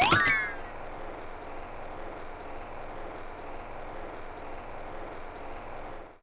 spin Button Click; netent; reelrush

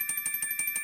count Up Loop; netent; secretofthestones

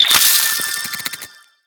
cocoon Hatch; netent; butterflystaxx

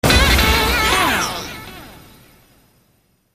medium Win; netent

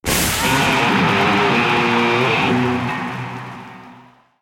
bonus game guitar; netent

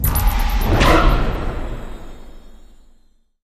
bonus Outro; netent; bloodsuckers

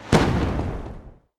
betline End Explosion; netent; wishmasteroct

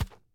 reel Bounce; netent; wildwater

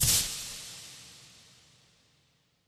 spin Button Down; netent; doublestacks

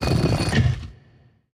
bonus Coffin; netent; bloodsuckers

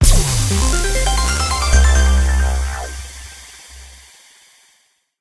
win Large; netent; doublestacks

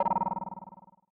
quick Stop; netent; neonstaxx

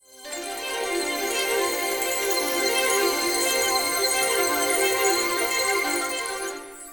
dazzle Winning Symbols Ramp Up; netent; fortunerangers

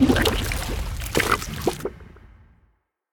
symbol Turn To Wild; netent; wishmasteroct

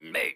Running Dance Snd; netent; eldorado; gonzo

